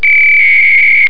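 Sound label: Packet Radio 300 Baud; Betriebsarten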